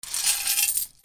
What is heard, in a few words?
MONEY